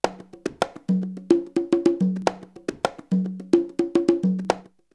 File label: Drumloop; ZERO G - Datafile DISC 3